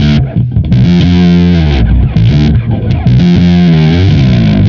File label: Bass, Distorted; Bass X